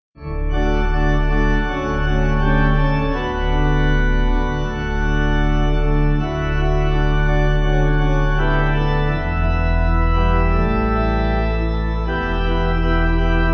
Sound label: Pipe